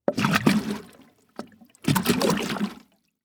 Liquid Water Water Jug Bubbles In Bucket Of Water

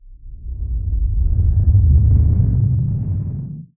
Title Transition Stab Dark Short; Production Element; AdobeCC

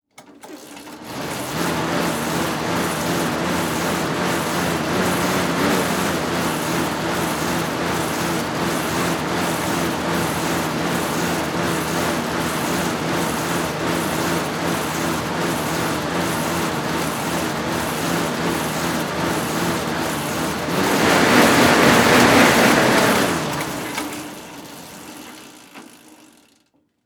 Sports Bike Pedal Exercise Bike

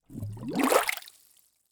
Liquid Water Bubble Surfacing

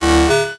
Digital; Adobe; Multimedia Internet CD-Rom Flash

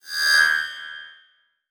Transition Feedback Ring; Production Element

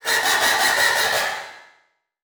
Transition Tremolo; Production Element

